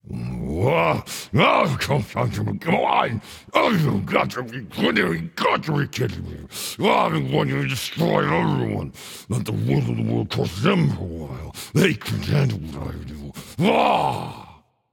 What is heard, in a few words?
grumble; Smite; atlas